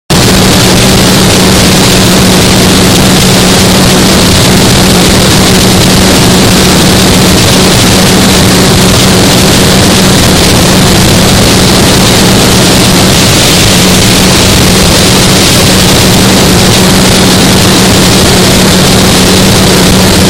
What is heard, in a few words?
Morning Noise Broadcast